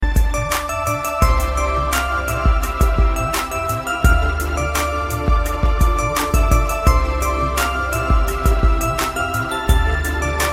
Piano Ringtone

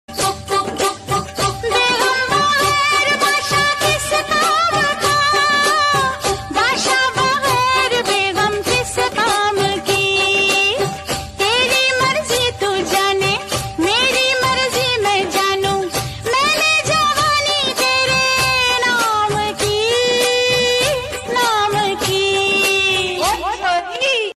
Female Version

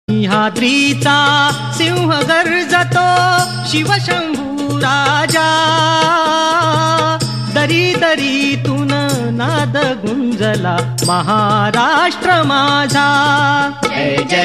Jai Maharashtra Marathi Ringtone

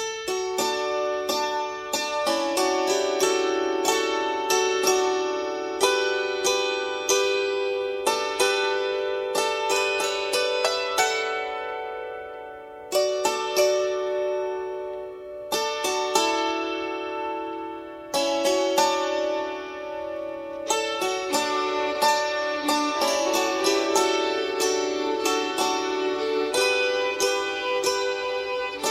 Instrumental Ringtone

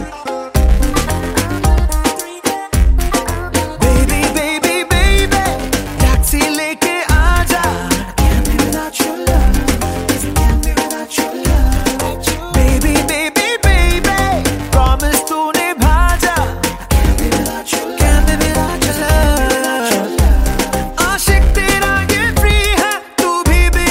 Romantic Tones

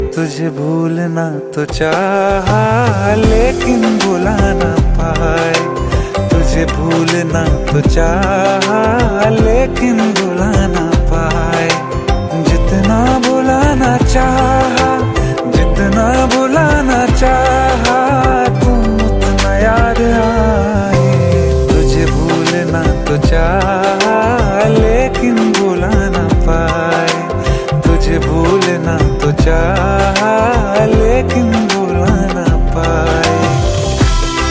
Song Ringtone

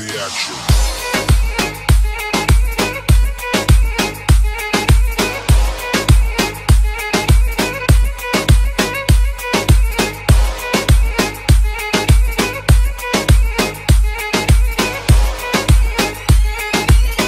Music Arabic Ringtone

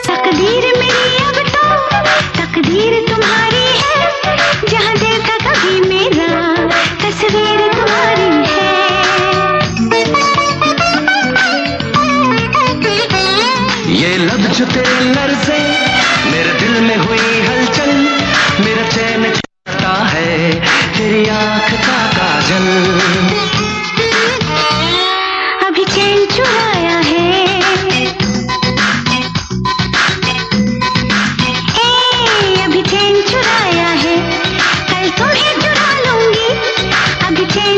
Song Ringtone

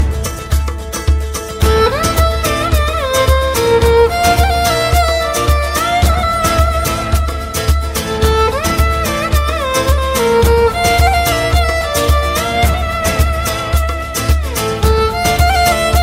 Turkish Violin Music; Ertugrul Gazi

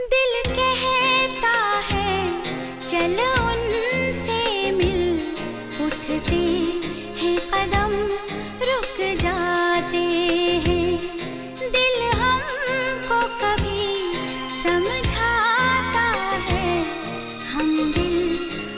Song Ringtone female Version

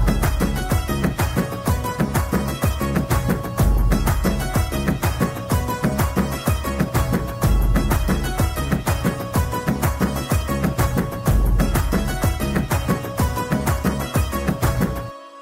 Instrumental Tone Ringtone

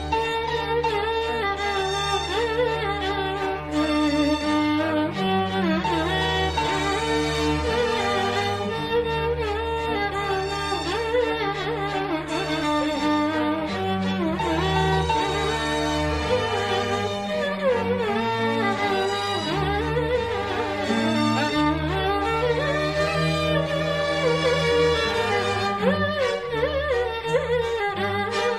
Very Nice Instrumental Tamil Ringtone

